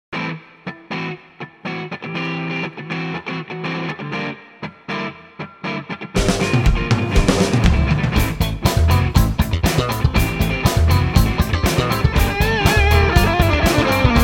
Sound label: pad